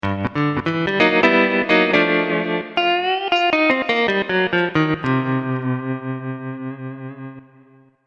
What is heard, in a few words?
Surf Gtr; fantom-x8; patch demo